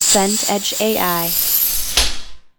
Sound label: airlock open